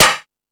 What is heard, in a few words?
House Machine, Drum Machines